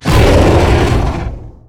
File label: Amalgadon Attack